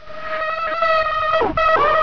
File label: alert, gonarch; svencoop; cracklife